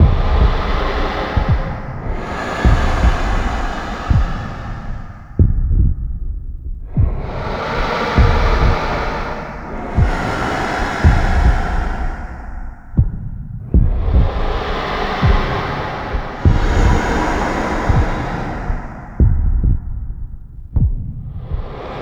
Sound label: Heartbeat Loop; Props; Warframe; Tau; Ambience